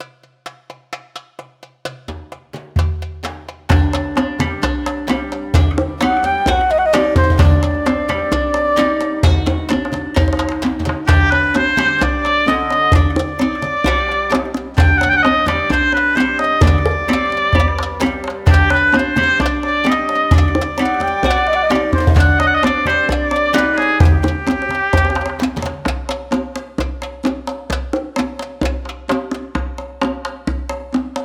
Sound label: Arabian Alley